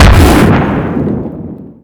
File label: flame nade